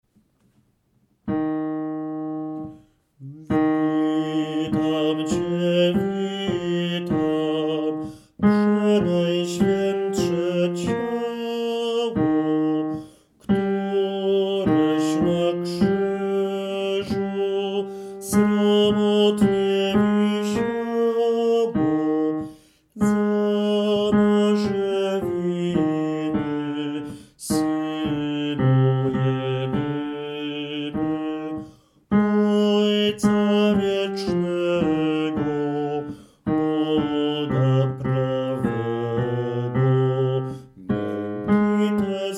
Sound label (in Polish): BAS; Witam Cie witam